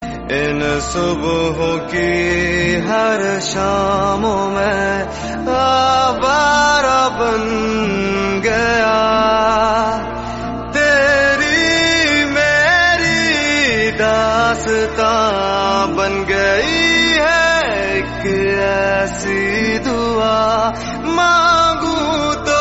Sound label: movie song; kaabil